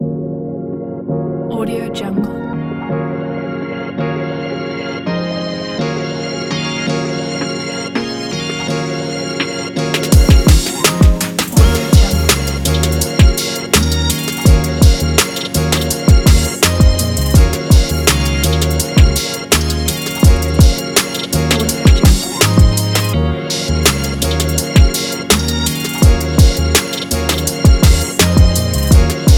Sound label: hiphop